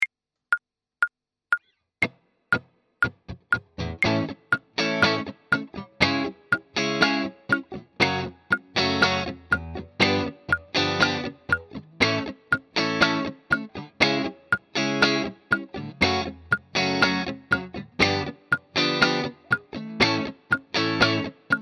Beispiel Anwendung 120 BPM

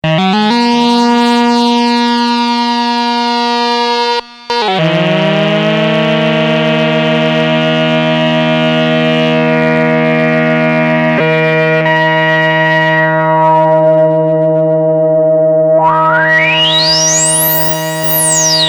Minimoog Solo Oberton VCO

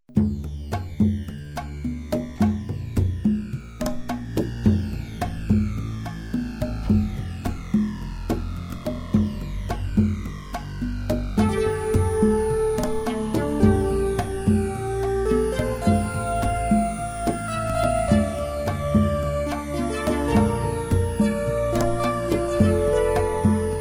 Melodie

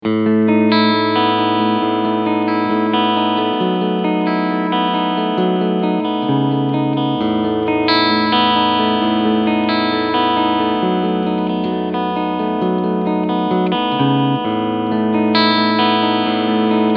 Chapman Guitars ML3 Pro Modern Semi Hollow Cleansound Steg Humbucker; SC Modus

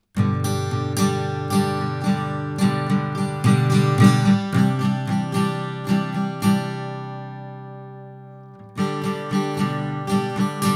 Gitarre DYN; SSL SiX

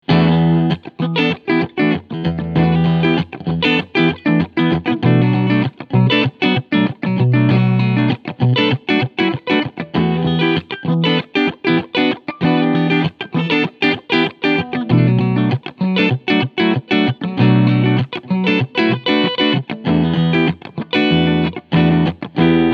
Squier Telecaster Cleansound beide PUs; 40th Anniversary

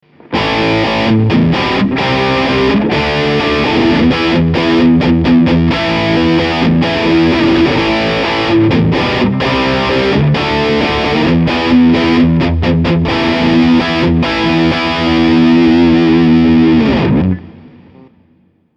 Engl Fireball 25 Lead Channel 12 Uhr Bridge PU

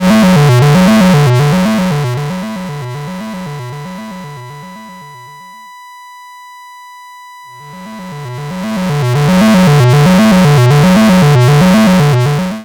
24db LOW PASS Bleed von FM Input in das Filter; GRP Module

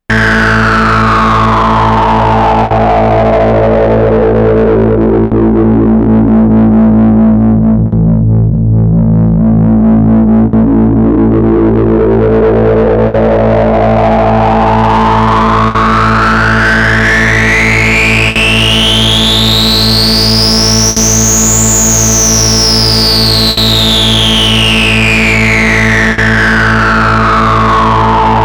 Korg Volca Bass LFO auf Cutoff

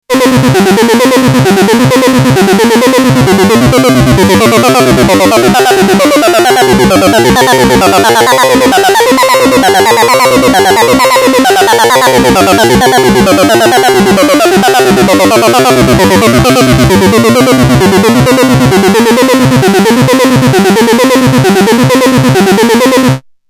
Sync klingt merkwürdig

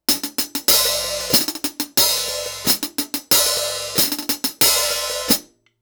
MB85 Beta kabelgebunden Hihat 15cm; the tbone

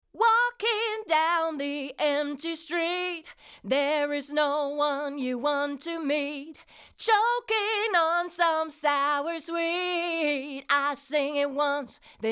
Vocal 3 zu 1 max. GR 8 dB AIR 2; Golden Age Project Comp 554 MK II

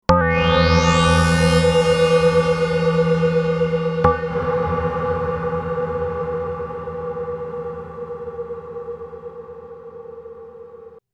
Korg NTS 1 Hall

